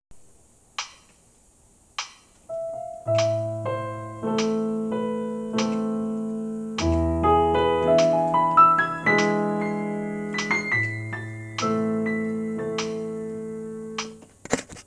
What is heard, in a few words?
Chopin Waltz A Minor